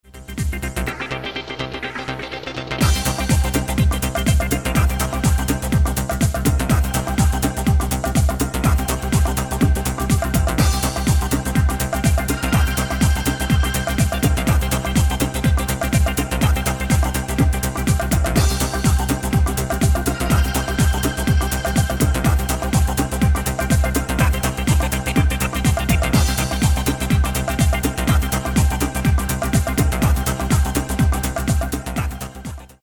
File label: Electric Guitar Hottube